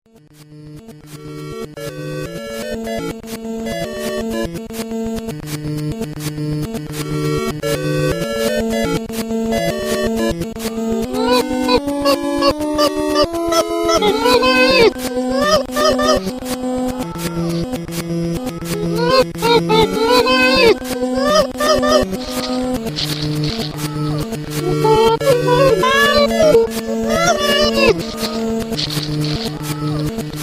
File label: seal; reverse